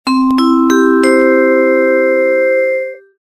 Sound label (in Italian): campanello; LEGOLED